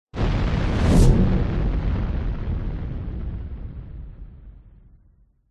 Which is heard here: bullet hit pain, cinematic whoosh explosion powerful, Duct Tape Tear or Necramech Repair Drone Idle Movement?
cinematic whoosh explosion powerful